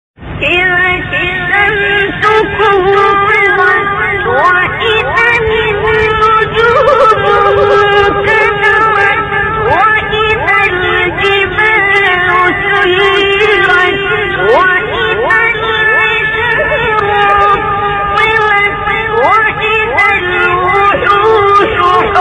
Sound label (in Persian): فراز ماندگار سوره تکویر; استاد عبدالباسط